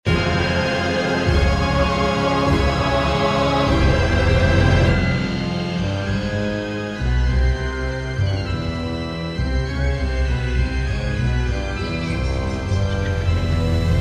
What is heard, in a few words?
Halloween Battle